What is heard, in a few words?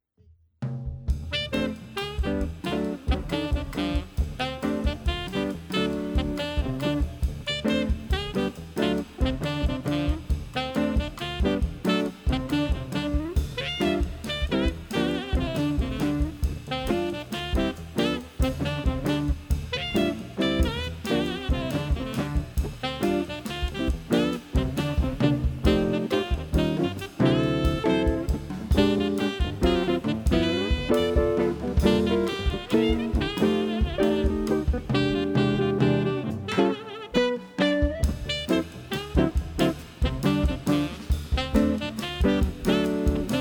Blues